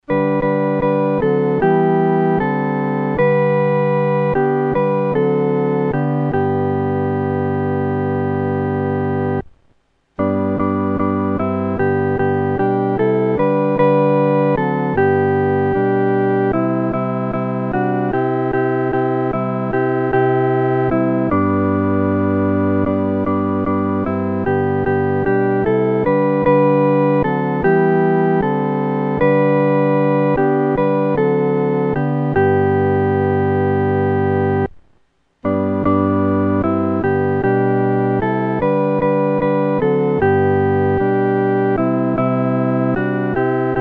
合奏（四声部）

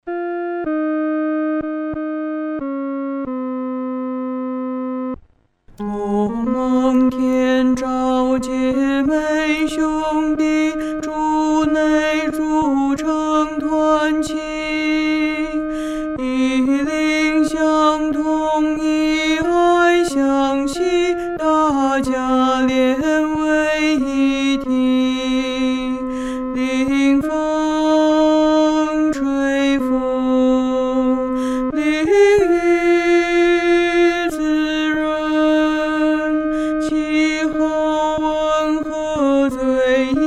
独唱 第二声

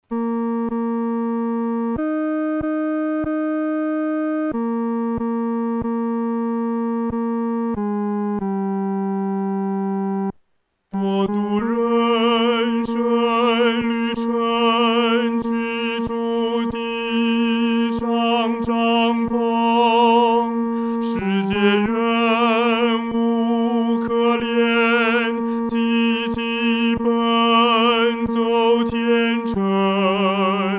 独唱 第三声

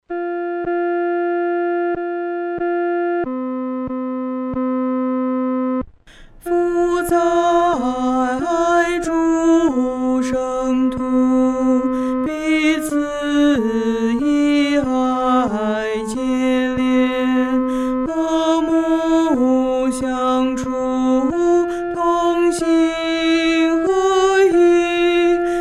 独唱（第二声）

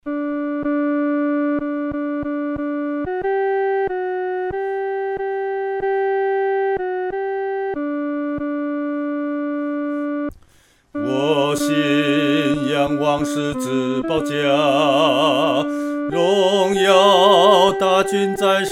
独唱 第二声

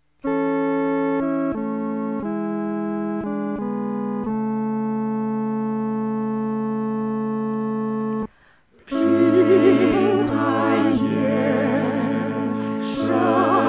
平安夜合唱四声合唱

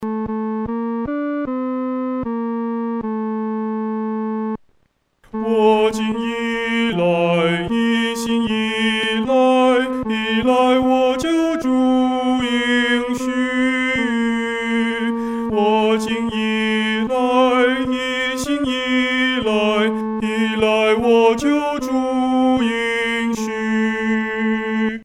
独唱 第三声

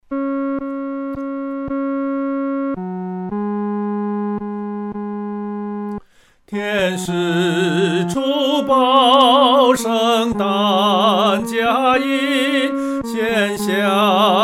独唱 第三声; 天使初报圣诞佳音

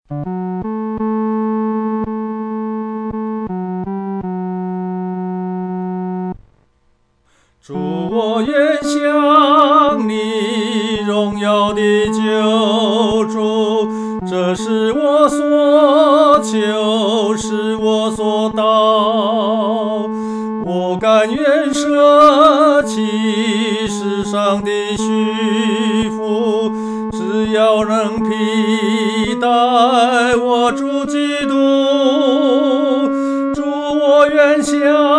独唱 第三声; 主，我愿像袮

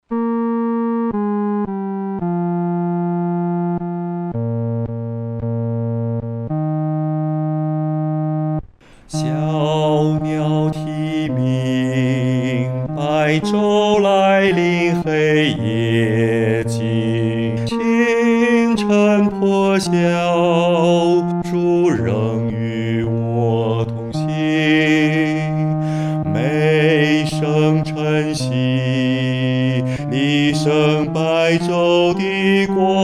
独唱 第四声

